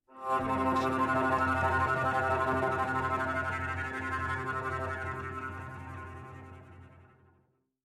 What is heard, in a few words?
Cello Sul Pont Tremolo; SoundsCrate